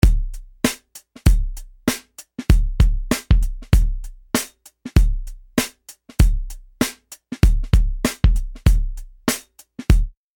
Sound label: Drums Dry